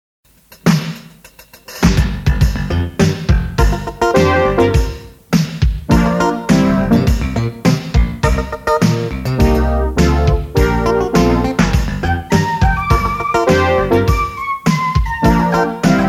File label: Groove; Europa-150